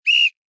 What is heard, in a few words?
Sports Referee Whistle Blow; Whistles; Objects